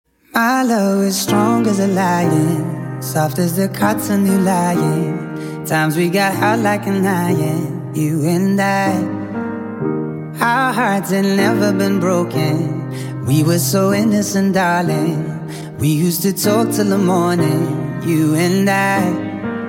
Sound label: Piano Acoustic